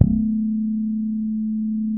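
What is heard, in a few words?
A 3, fretless harmonics, bass; Spectrasonics; Bass Legends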